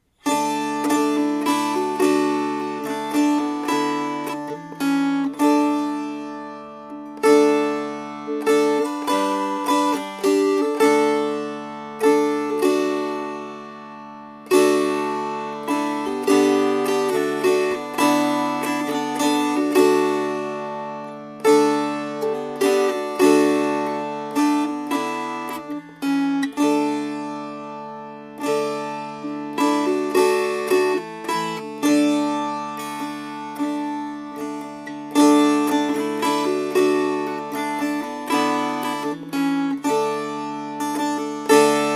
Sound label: Sir Donkey Strummed